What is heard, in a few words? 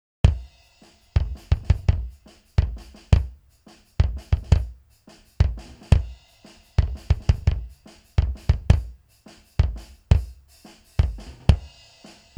BD 1 Drums